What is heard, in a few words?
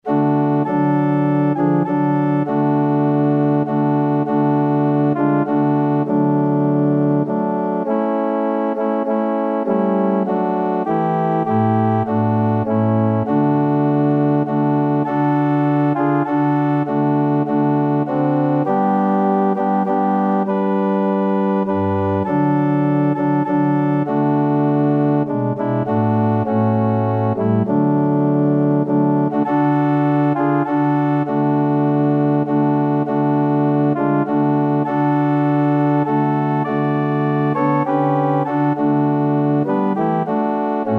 child of the king, organ